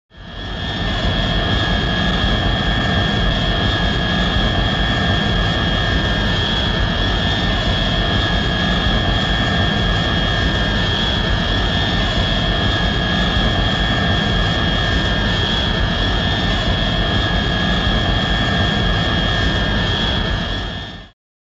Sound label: Jet; Engine Idle; Constant Exterior Jet, Air To Air.